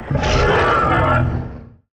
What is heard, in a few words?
monster